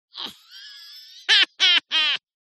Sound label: cartoon laugh high pitched